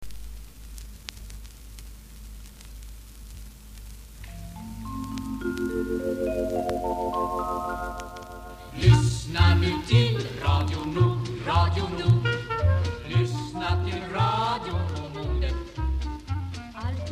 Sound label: jingle radio nord